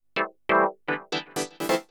synth house 125 A; eJay; Keys

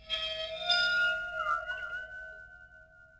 Danger Green; UrbanChaos

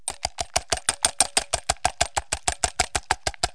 Whipping Cream; Household; Foods

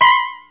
High Stress; BusyBox

